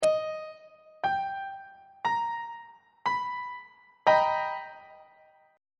a double sharp augmented major 7th 1st inversion on treble clef